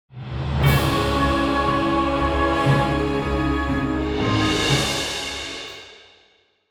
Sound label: Stinger